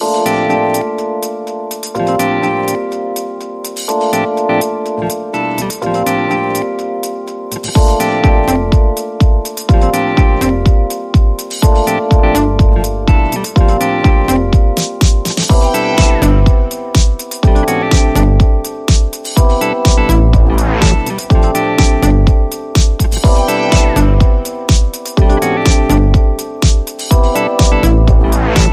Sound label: ElectroSwing, Underscore